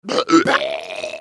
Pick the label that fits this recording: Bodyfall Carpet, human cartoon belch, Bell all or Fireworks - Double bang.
human cartoon belch